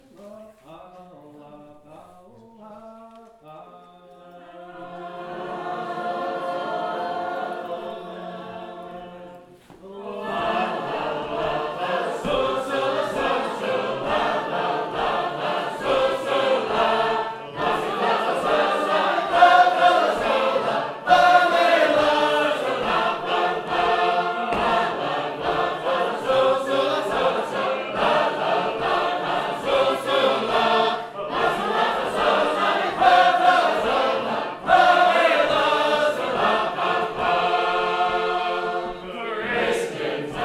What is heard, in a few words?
Sacred Harp; Triumph